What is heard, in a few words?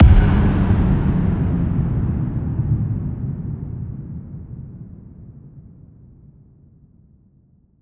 Riddim Impact, Drown; One Shots; Ghosthack; Riddim Essentials 2020